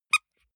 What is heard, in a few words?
squeaker squeeze soft